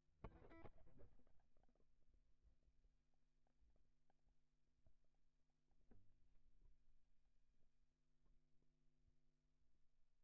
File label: Small Bright Combo; logic